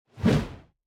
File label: single blunt